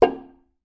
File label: African Wooden Drum Hit; ETHNIC and WORLD PERCUSSION